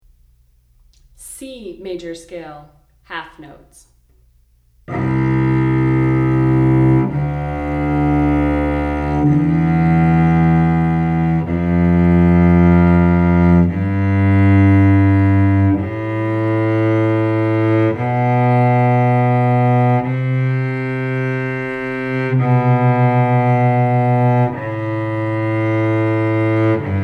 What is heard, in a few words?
Scale C M 1 Oct, cello; C Major; 1-Octave Scales